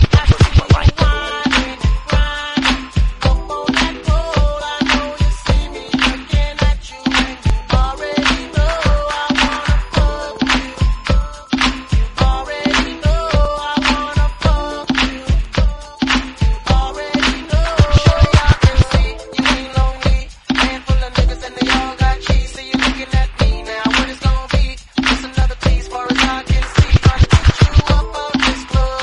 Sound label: flute